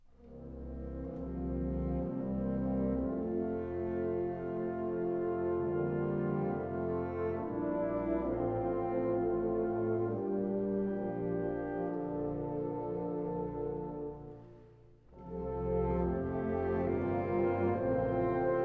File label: Wind Orchestra; Grainger Edition Vol 4; Irish Tune from County Derry